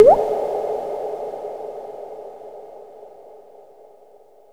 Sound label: drip reverb